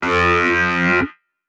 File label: Lead - F; 99Sounds; Monosounds; Music Loops; Instrument Oneshots; Leads